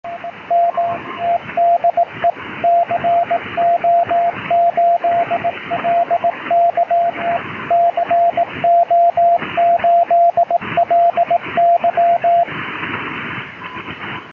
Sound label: 50.095 MHz CW, ham radio; 10 July 2007 - CO8LY in Cuba